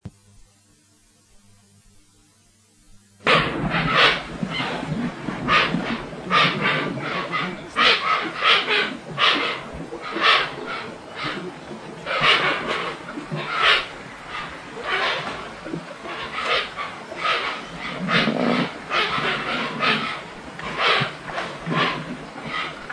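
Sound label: Hoatzin, bird; گندمرغ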